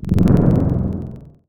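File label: brass Tuba, Wavetables; Synthetic's Serum Collection; Standalone-Music SYMPHONY Wavetables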